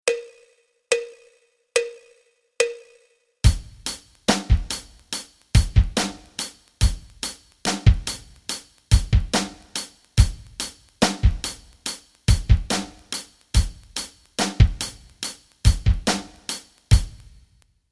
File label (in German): rock rhythmen